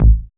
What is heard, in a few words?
dance bass; Sound & Vision - Gigapack II CD 1